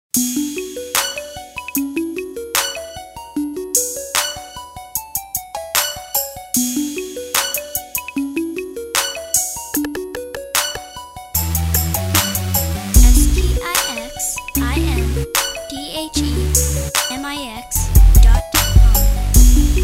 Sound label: mob bells